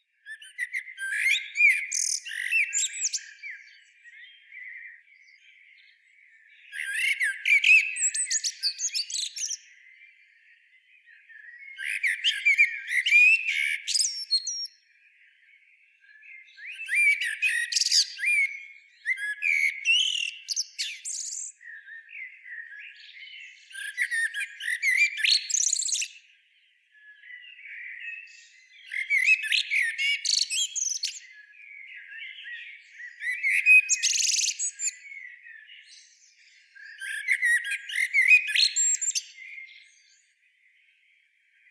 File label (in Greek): Kotsufas Turdus merula; menoume sti fusi